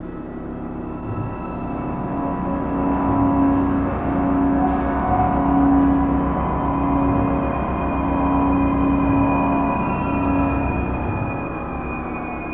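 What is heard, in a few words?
ambient no guitar; transformers war for cybertron gamerip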